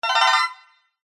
multimedia game sound short fast stuttering tone positive